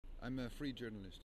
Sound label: loop